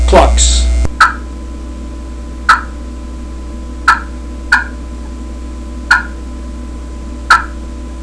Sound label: clucks, turkey hunting